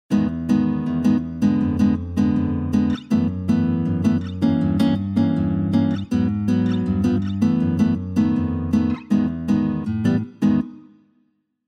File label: guitar